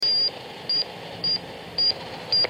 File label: EDACS Jingle; This sound was implemented by GE as a way for engineers & techs to be able to confirm the 'Channel Drop Message' had been properly sent